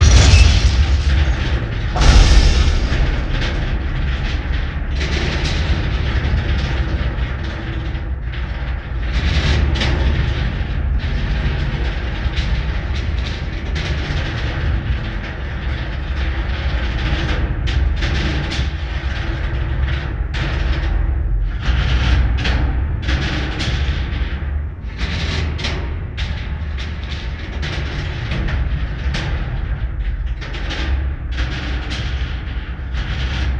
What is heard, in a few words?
Explosion Structural Expansion; Sony Pictures Sound Effects Series